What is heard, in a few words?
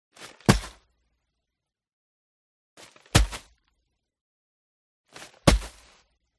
OBJECT IMPACT Sand Bag Drop; smartsound